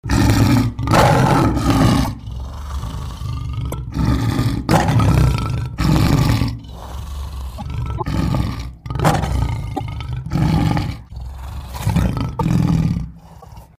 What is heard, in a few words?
Lion Roar